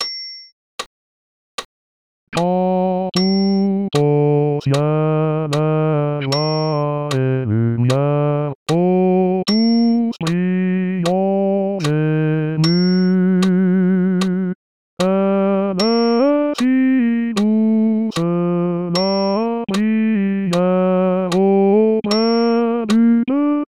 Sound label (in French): Basse; Pres de la creche